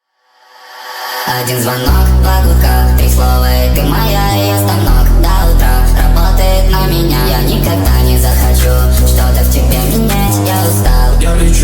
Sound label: speed up